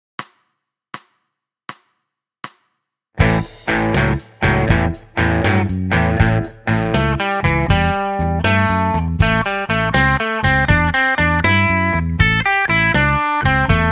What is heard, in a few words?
blues